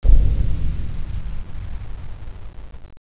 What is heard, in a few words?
TUBE BNG